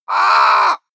bird raven

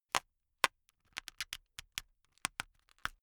plastic pressured long slow

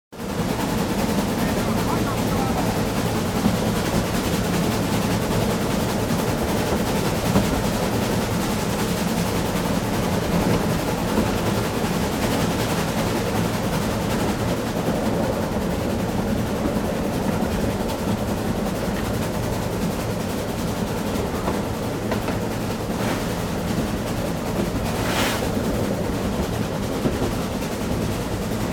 old train cabin inside fast

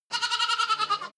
goat lamb baa

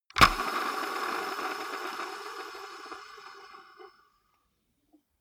gaming mouse wheel scroll smooth

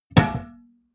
glass door small close hard

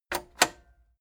cassette eject click slow heavy

